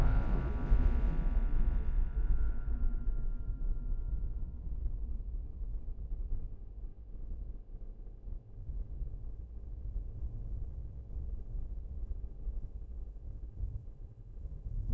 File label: fire crackle; sound effects